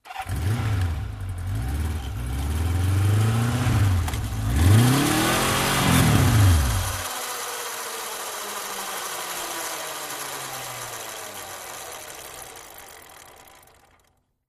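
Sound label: Motorcycle, Kawasaki 750, Idle Close Up, Rev, Cut Engine, Drivewheel Free Spin To Stop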